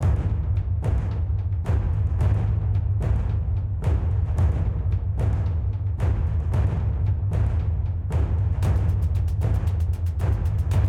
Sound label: Escape BGM; crossfire online